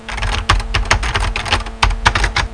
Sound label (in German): tastatur; winpolis